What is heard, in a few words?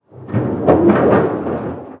wood roll